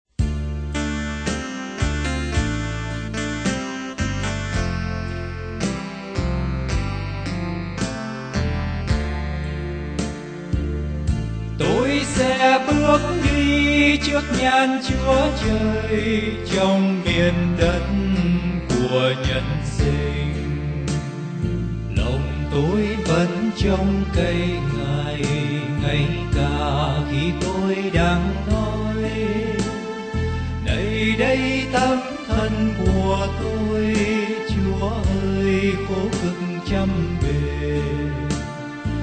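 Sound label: soprano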